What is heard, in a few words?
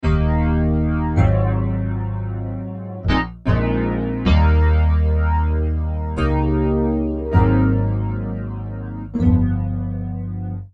Chorus - Electric Piano - Flangy; CRTIV Chorus; Flangy Guitar